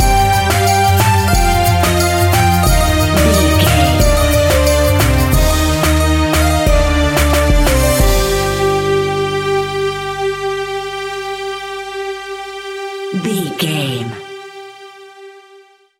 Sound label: south asia music ambience, An exotic and colorful piece of World music.; Groovy, powerful, exotic and wild.